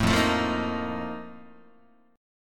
F 11th F, down, Guitar; F11 strummed

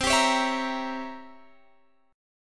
C 7th Flat 9th C Guitar Drop D

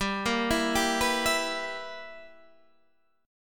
B Major 7th Suspended 4th Sharp 5th G

